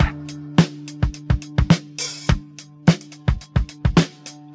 106 bpm modern drum loop sample d key

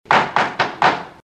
pounding gavel, these are the charges; judge

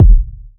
perfect kick sound d key

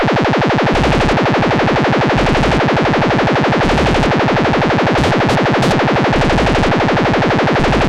monodelay - Fm - 119, synth loop

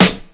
rap steel snare drum sound f sharp key

